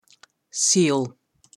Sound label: Seal